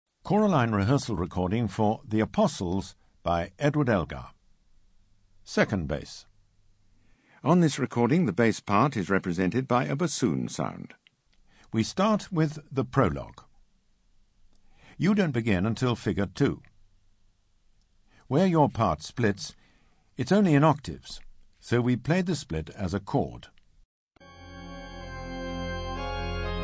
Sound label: Bass; Elgar Apostles